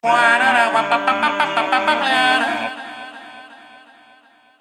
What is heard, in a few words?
Quirky Smile, notifications